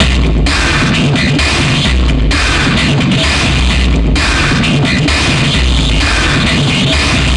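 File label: Loop, beats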